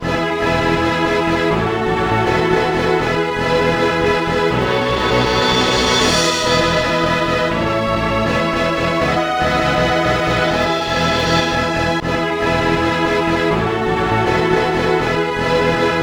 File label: Ambient Boss; AFL Mascot Manor; NDS